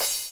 CRASH, Dance Drums; Best Service; Dance Mega Drums

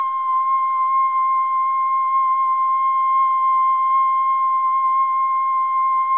SOLO BOY, OH; Spectrasonics; Symphony of Voices

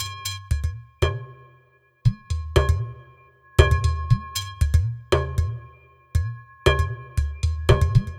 Quantum, Processed Percussion

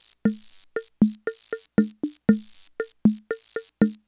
Loop, classic machines, rhythm77

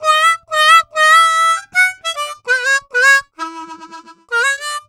Harm 098bpm A, Harmonica

